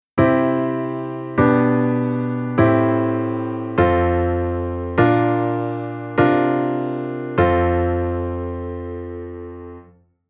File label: Chord Modulation Pivot